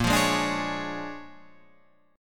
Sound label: Bb 7th Sharp 9th Bb, Guitar Standard; Bb7#9; strummed; JGuitar